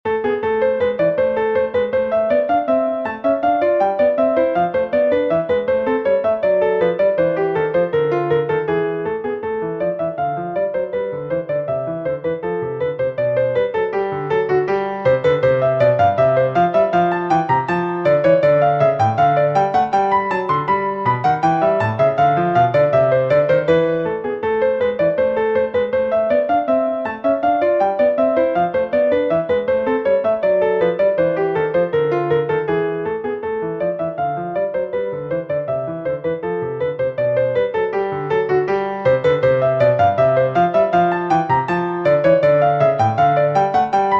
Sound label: Bourree in A minor